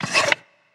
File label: table appear; Fruit Fiesta; Wazdan